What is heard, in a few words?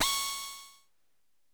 DRILL; Central Processing Unit - Electro Samples Vol. 1